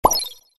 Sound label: Sound Hit Enemy